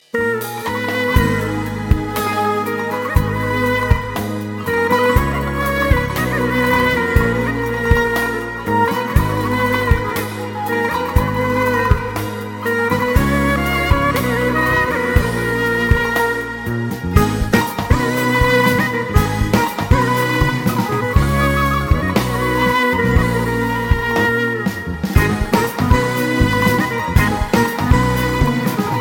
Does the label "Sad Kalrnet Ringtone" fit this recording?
Yes